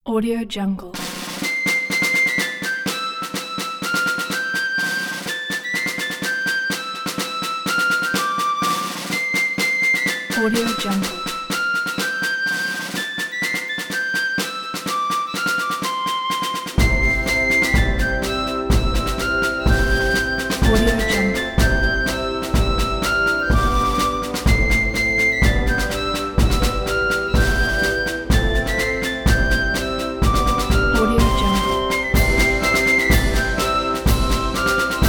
military marching

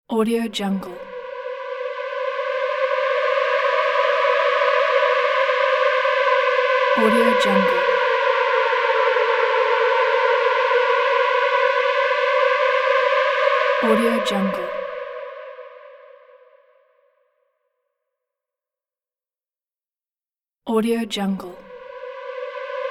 tension; bogdansfx

